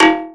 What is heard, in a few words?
Metallik, Synth FX; musicradar; cartoon caper